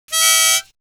Wind

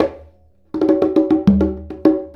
Conga Fill; Drums; Essential Percussion

